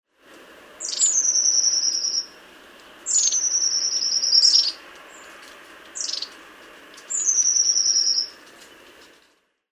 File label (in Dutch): staartmees